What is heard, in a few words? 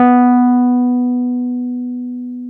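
piano, Wurly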